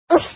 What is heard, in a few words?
Ooff